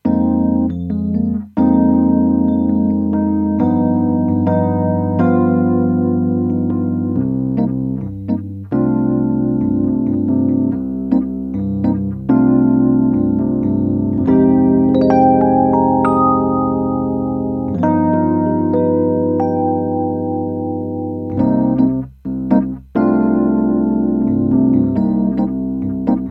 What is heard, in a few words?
Electric Piano Chorus; IbanezChorusMini